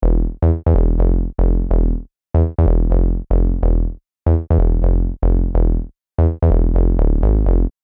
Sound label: Bass, Serum; Unison Serum Starter Pack; Grand Hotel